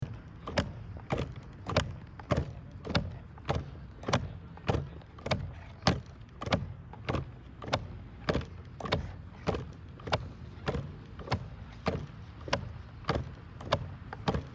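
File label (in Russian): dets kachalka prujyna, children's spring swing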